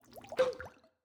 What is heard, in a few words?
ambience room alchemy lab potion ready